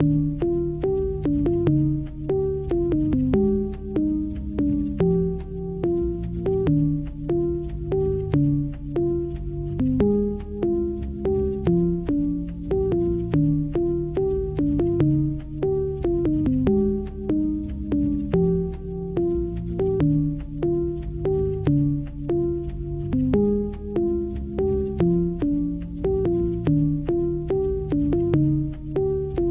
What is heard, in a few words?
piano